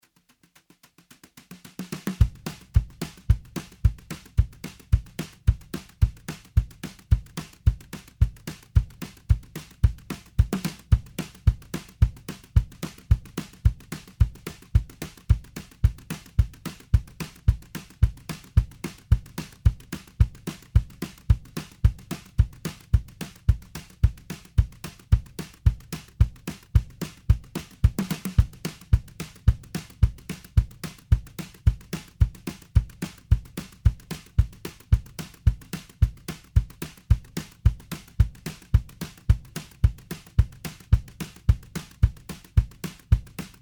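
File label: new wave, full mix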